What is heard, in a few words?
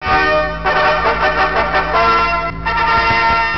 medieval fanfare